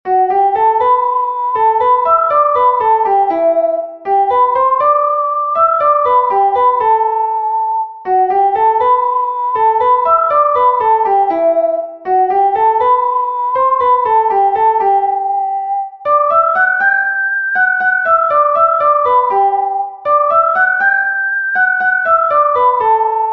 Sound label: Danny Boy Tin Whistle